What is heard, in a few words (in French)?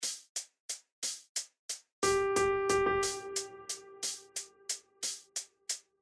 2 Croches 2 Double croches Ternaire